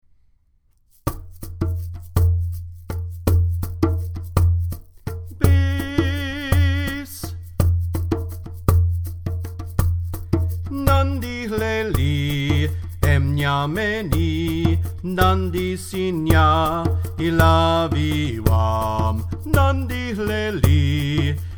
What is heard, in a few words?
bass